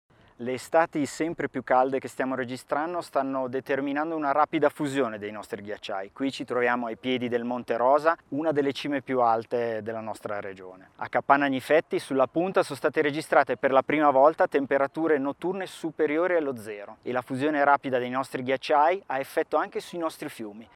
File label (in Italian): sonoro arpa